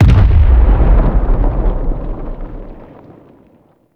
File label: Teralyst Far Step; Enemies; Steps; Warframe; Eidolon